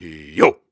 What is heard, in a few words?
Grunts and Chants, Viking Vocal